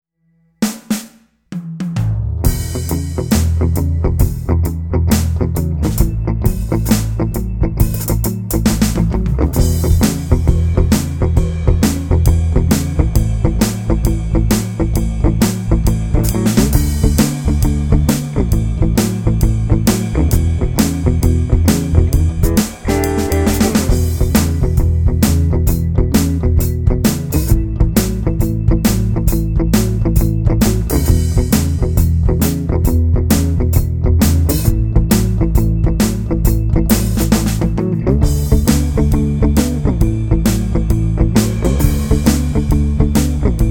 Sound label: blues shuffle boogie e a d